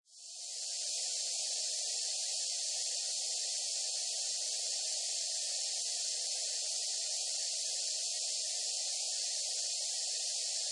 Steam, Steady hissing steam release with soft start.; Machinery